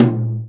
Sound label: tom, Real Drum Kit, jungle kit